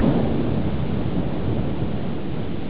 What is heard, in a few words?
Power; ToTheMoon!